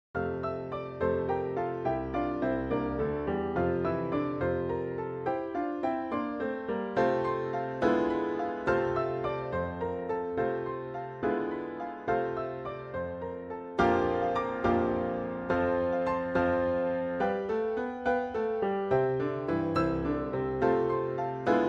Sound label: Piano Trio